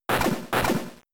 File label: Crunch